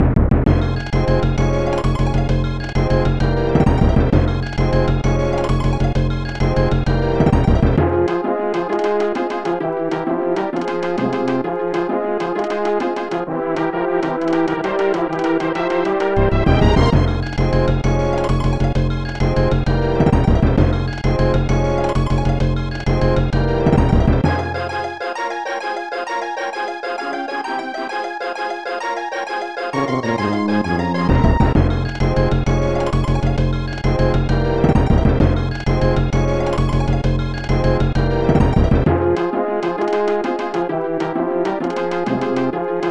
snes boss bossanova; donkey kong country 2

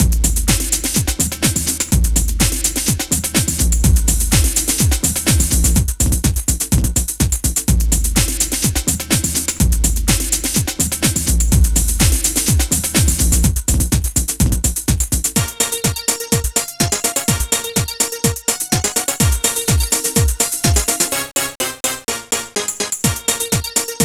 amiga housemix

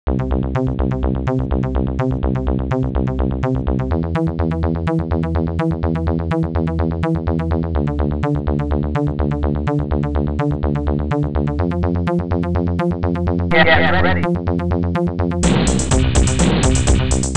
heavy; norwegian light force; track master

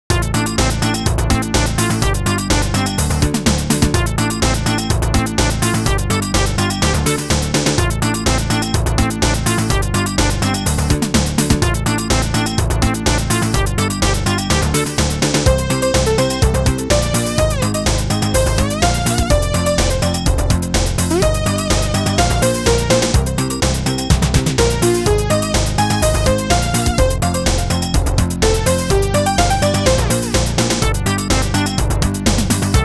intro muzak; mirage

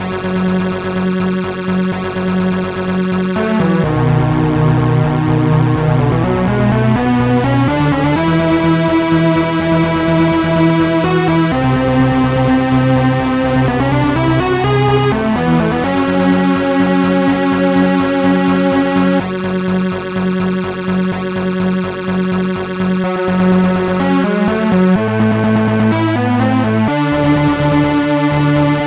amiga title; strider

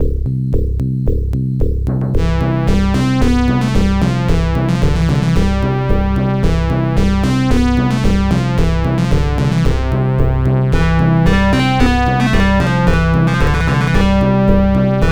arcade ranking march; golden axe; battle axe

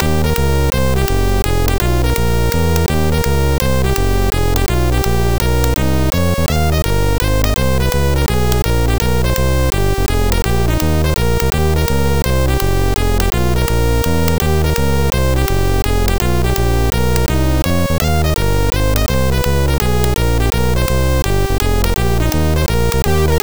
c64 jazz

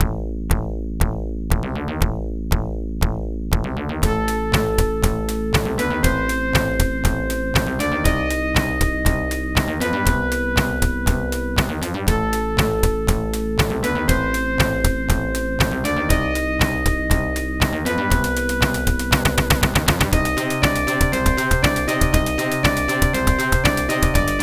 arcade background music level; alien syndrome

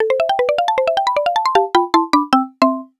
arcade clear; bomb jack

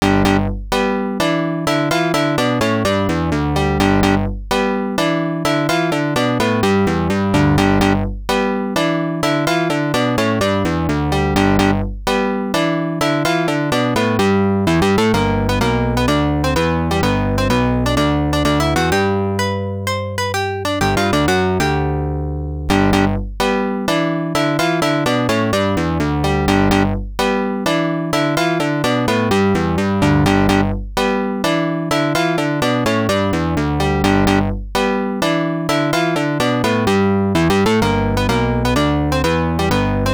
arcade main; elevator action

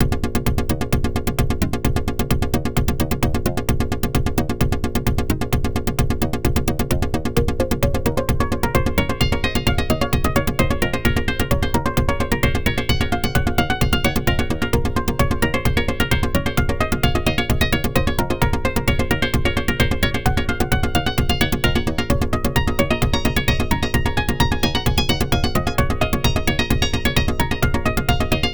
pc battle time

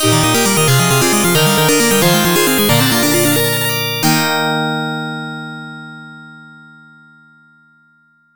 arcade 1st place entry end; ghosts n goblins

